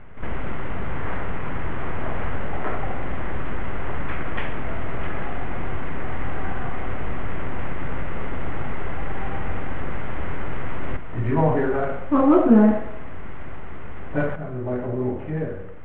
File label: Child crying